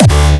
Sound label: hardstyle d